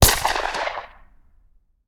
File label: Bullet Impact; Sound Ideas; Guns